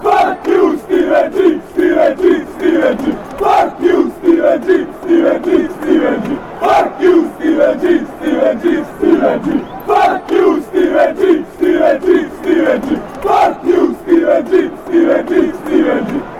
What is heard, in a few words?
montenegro chant